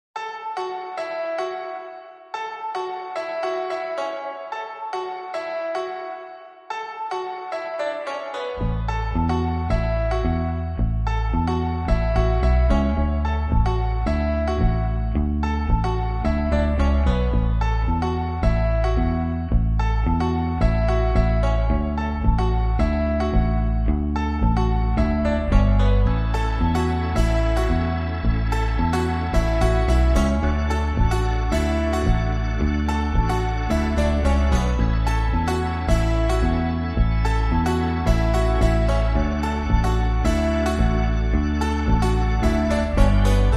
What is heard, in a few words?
Lead Motif Bass and Organ Only